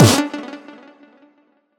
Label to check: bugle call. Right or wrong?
Wrong